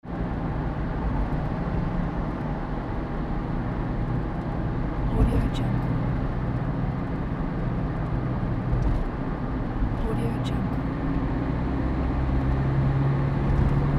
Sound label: inside car